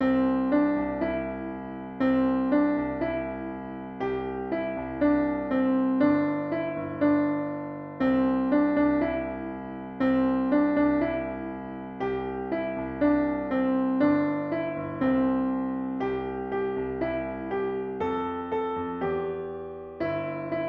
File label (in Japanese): ピアノ